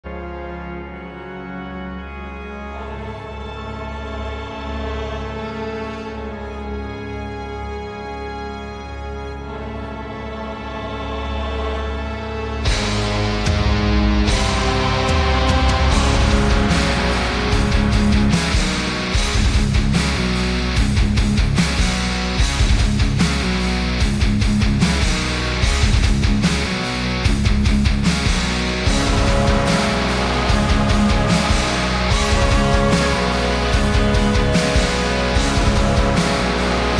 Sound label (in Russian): ai cover эпичный power metal